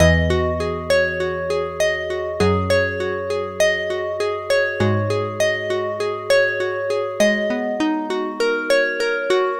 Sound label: dx harp